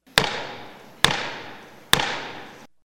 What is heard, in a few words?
gavel sound